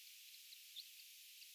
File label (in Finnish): jip aani